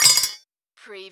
CARTOON METAL CLANKS

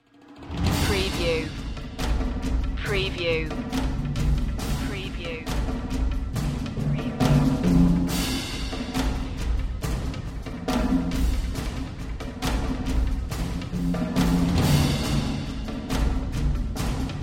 percussion

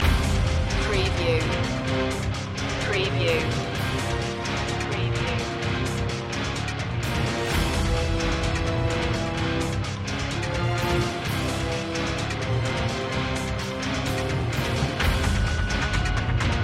industrial eeriness loop

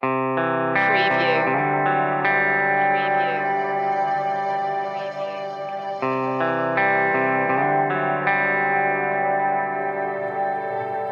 awakened no drums

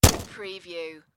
Machine Gun HK416

